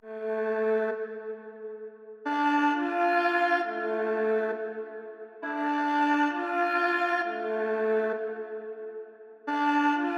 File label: 133 BPM A Min Violin, Drill; Melody; Stems; Cymatics; 2022 Melody Collection (BETA); Smoke